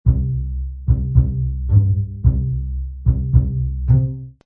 Orch Bss Pz C, Orchestral bass loop pizzicato C Major 110 bpm.